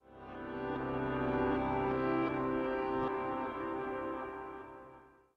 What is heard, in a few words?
Learning chime